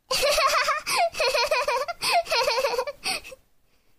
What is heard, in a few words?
laugh kid